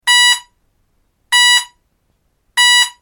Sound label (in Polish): ton